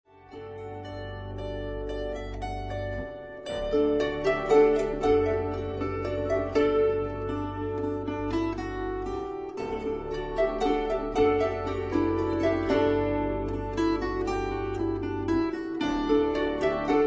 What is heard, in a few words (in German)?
Walzer, Trio